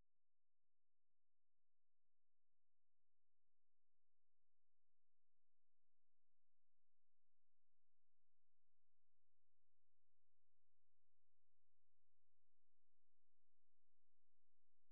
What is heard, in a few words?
sine